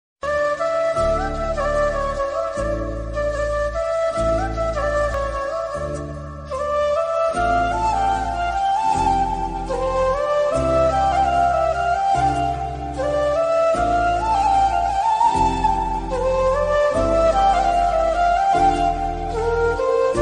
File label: best old instrumental ringtone old is gold flute music